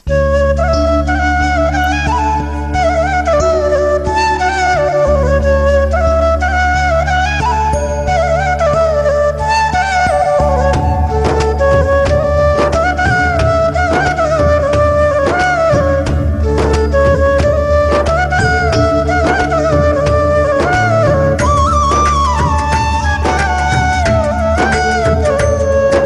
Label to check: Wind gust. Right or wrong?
Wrong